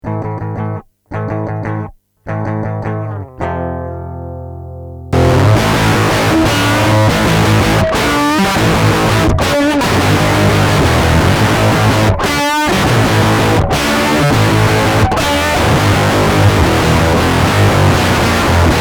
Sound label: guitar